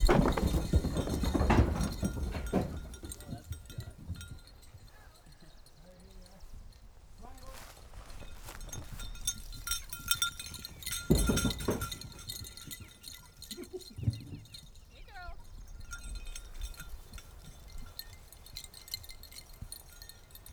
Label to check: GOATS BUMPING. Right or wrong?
Right